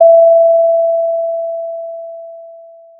pop, Sound of a doorbell

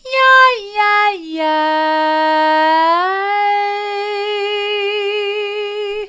yi yi yi mid, vocal

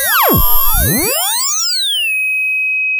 pop, sound of a bike bell

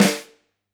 Flam, drum

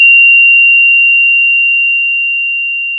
pop, Sound of a school bell

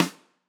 Rim

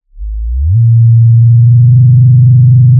pop, Sound of a cruise ship horn

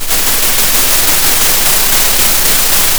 pop, Sound of a thunderstorm

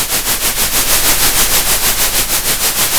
center, Sound of a train

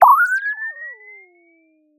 Sound of a bird tweeting nicely

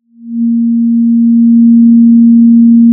Sound of a cruise ship horn

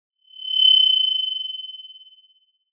Sound of an owl

